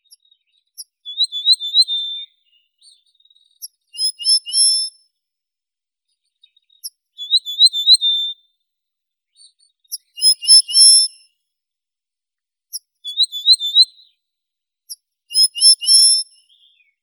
ovsyanka krasnokluvaya emberiza caesia

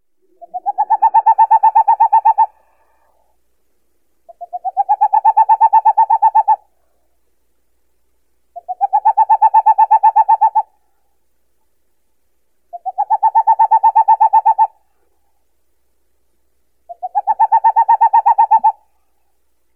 sich mohnonogii aegolius funereus

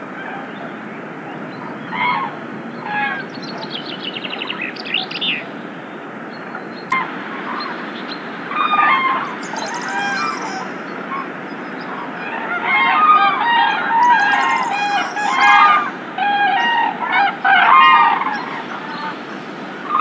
zhuravli; vozvrashautsya na rodinu

